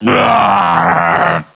die; svencoop; cracklife; garg

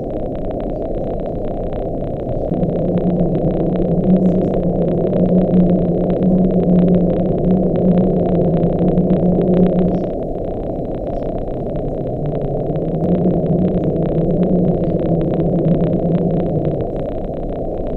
underwater rumble; svencoop; legends

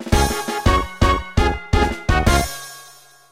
timeout music; w00tguy; botparty

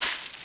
pl metal, player; svencoop; cracklife

